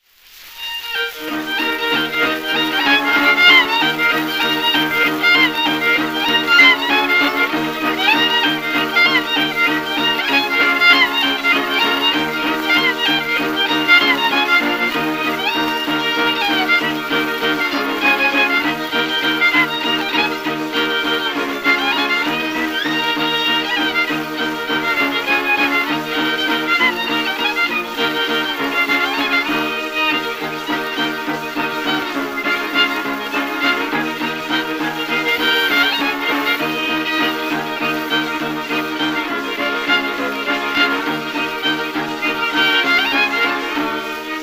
Cajun Waltz; usa

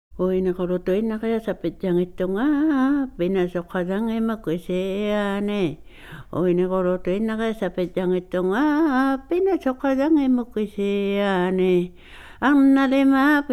Spinster's Song, INUIT

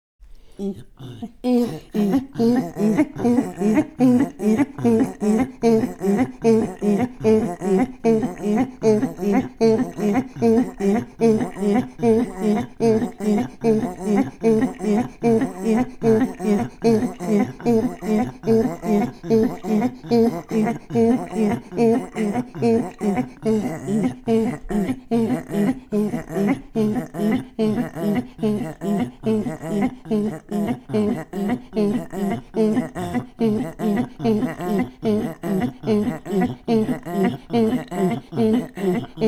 Throat Singing, INUIT

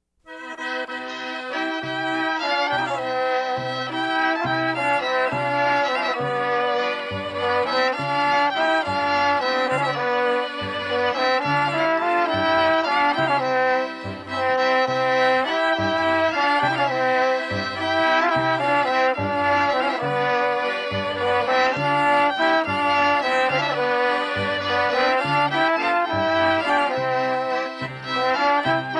village artesan band Piedmont Waltz; Tonco; La Ricciola; PIEDMONT, EMELIA, LOMBARDY (vol1) DALLE RICERCHE DI ALAN LOMAX IN NORDITALIA

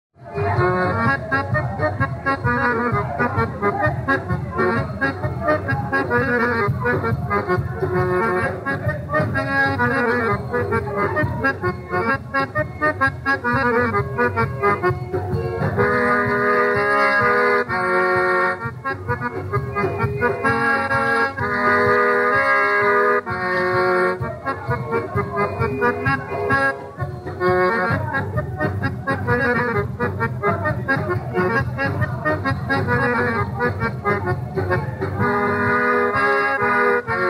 galop

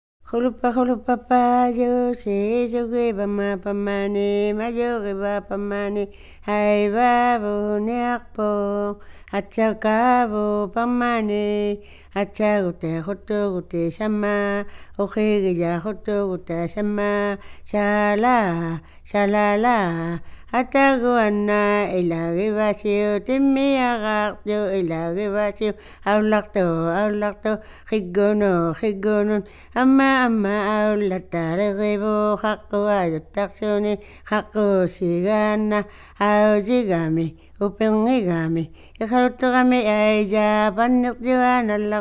Juggling Song, INUIT

